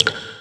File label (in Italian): goccia, natura